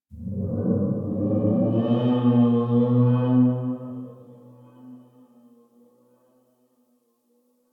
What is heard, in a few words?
Exterior Distant Calls, Ambience; Warframe; Duviri